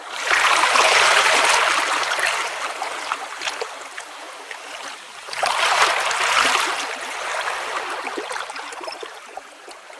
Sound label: ocean waves; Ambient; ambient loops